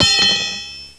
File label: wrench